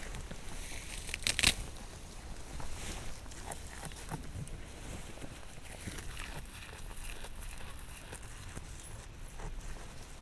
kecske fűvet tép; állathangok; paraszt udvar; házi kecske

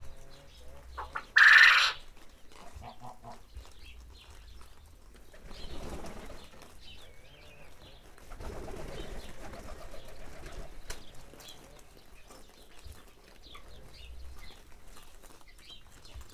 galambbal, fürj; termeszetben

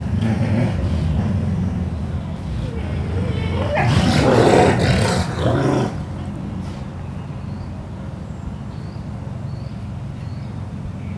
egymásra morgó állatok, szürke farkas; szegedi zoo 2011

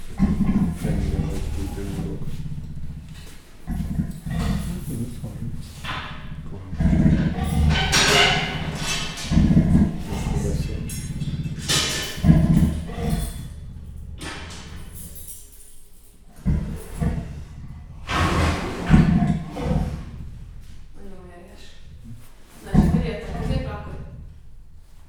köztes idő, leopárd; jaszberenyi zoo 2016

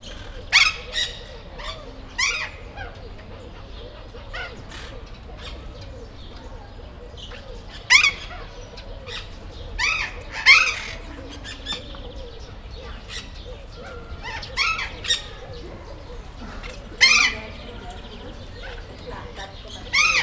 madarhaz kifuto, madarak; állathangok; nyíregyházi zoo 2010

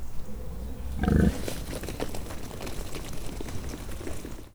vaddisznó elfutnak a kismalacok; budakeszi zoo 2010

